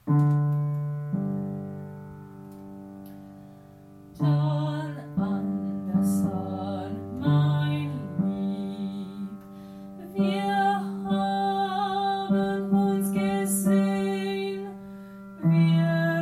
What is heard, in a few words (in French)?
basse